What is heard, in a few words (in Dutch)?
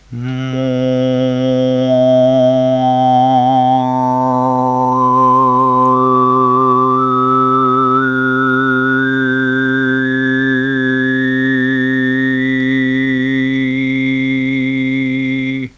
boventoonzang